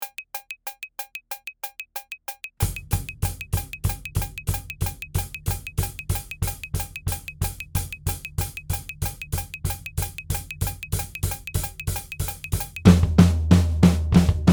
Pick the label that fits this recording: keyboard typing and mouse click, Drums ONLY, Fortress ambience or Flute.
Drums ONLY